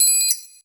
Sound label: PERC, TIMBA, Hip-Hop & R&B; USB Soundscan vol.47; AKAI